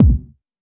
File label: short reverb kick single hit b key; tuned drums